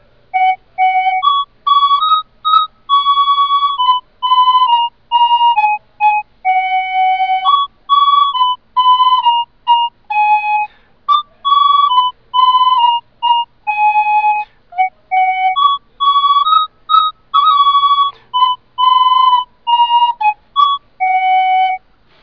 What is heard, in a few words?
duet